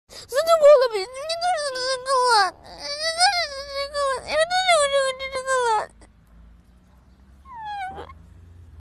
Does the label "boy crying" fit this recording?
Yes